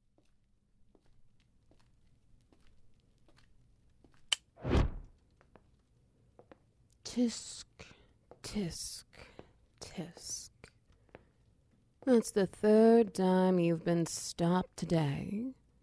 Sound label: Ambience, Wet Noises; Another Test, Another Failure